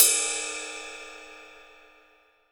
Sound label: Ride Cymbal, 22INCH ISTAN RIDE